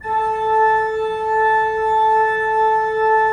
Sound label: Pipe Mix Celeste, 3D Organs